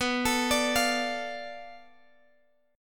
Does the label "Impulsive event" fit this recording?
No